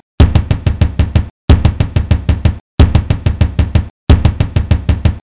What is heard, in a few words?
drums